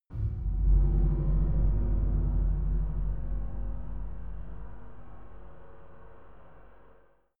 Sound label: scary metal chime